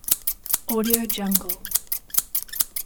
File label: scissors